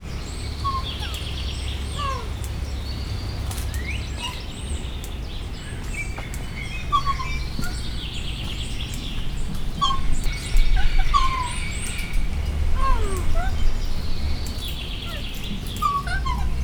kedves hangocskák a végén elszaladnak, gyűrűsfarkú maki; pecsi zoo 2017

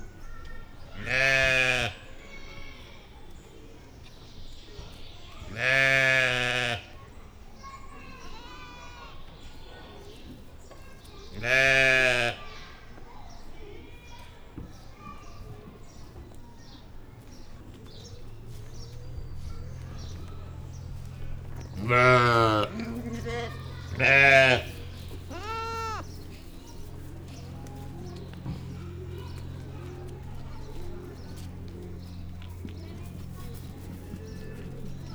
kecske halkan szamár pata; szegedi zoo 2011

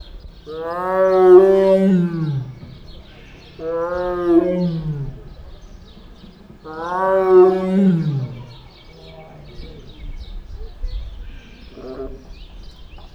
tiszta auuu, feher tigris; állathangok; nyíregyházi zoo 2010

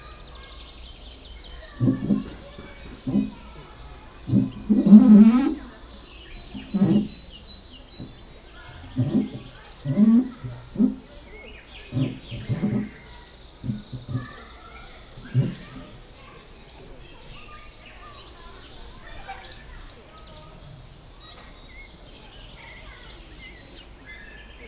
orrát a plexihez nyomva mozgatja, közelről, fehérkezű gibbon; jaszberenyi zoo 2016

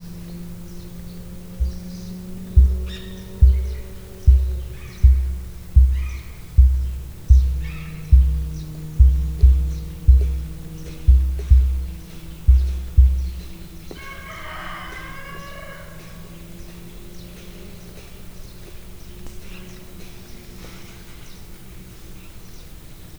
távolban autó, emu; miskolci zoo 2009

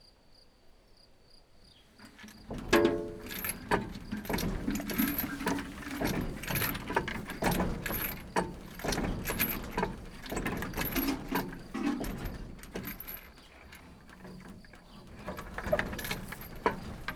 összmunka, kerekes kút; varboc

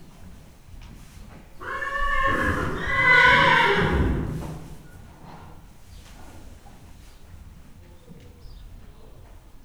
két nyerítés, ló; Hortobágyi Nemzeti Park 2013; máta

